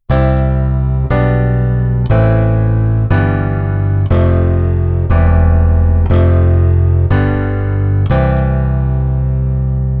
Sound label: Bass Chord Bass